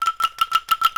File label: E5 ANKLUNG, JAVA ANKLUNG; WorldSounds